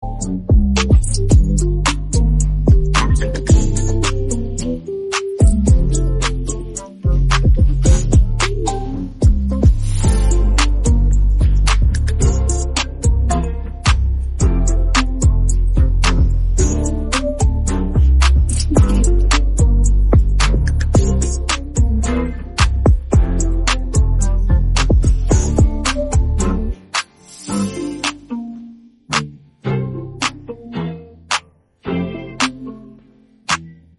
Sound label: instrumental ringtone